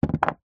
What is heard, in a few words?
office eraser rubber down on surface; zapsplat